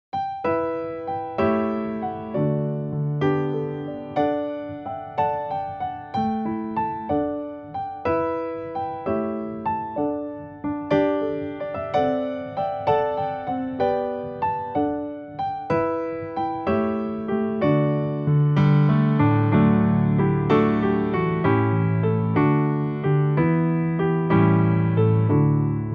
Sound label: Backing; iniciantes